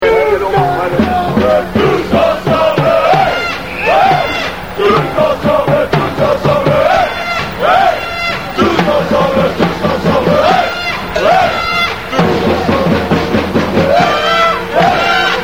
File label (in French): ensemble; TOUS ENSEMBLE....; ALLEZ AMIENS; CITY HOCKEY